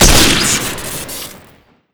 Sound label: garand shoot crit; tf2